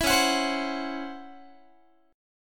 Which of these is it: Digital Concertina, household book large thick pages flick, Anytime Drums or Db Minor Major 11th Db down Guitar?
Db Minor Major 11th Db down Guitar